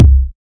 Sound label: original kick drum single shot c key